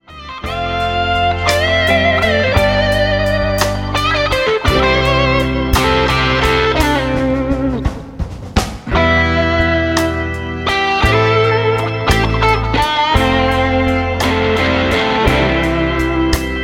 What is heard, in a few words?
TRIPLETS BLUES